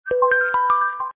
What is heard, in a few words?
xiaomi poco x3 notification ringtone